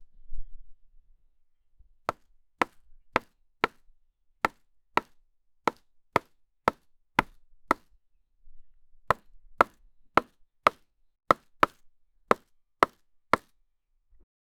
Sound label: crisp chopping sounds woo